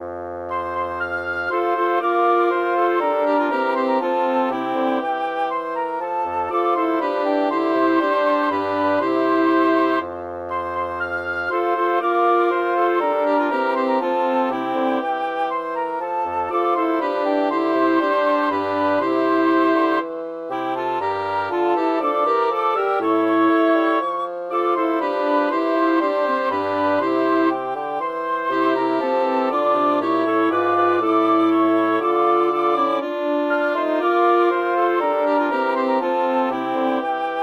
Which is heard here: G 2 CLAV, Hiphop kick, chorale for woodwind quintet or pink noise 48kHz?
chorale for woodwind quintet